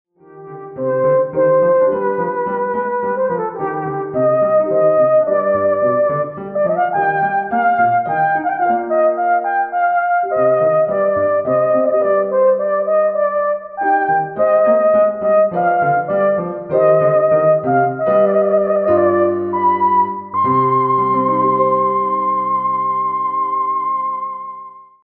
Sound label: Menuet